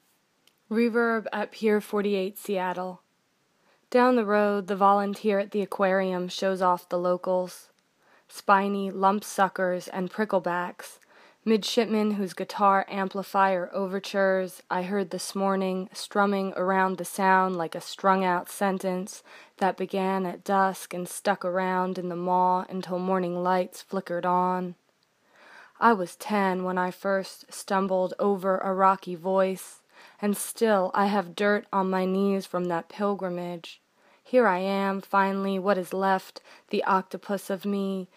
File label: Reverb